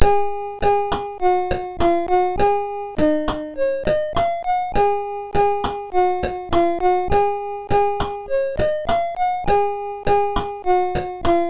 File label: 8-bit Ending Theme, sid, MOS6581R3